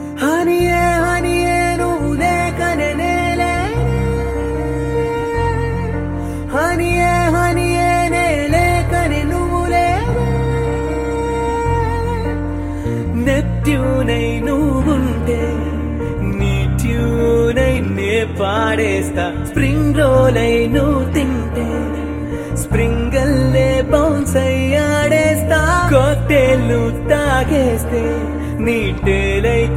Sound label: Male Version Ringtone